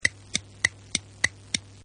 Clock Tick Tock; Clocks; Machines

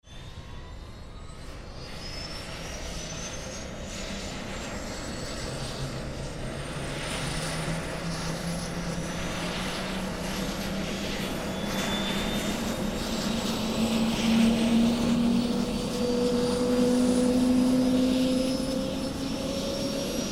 Helicopter Start Up Run Take Off Landing Pad; Transportation Aircraft

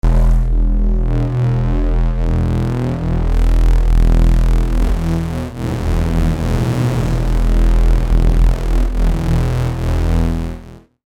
Electrical Low Hum; Buzz

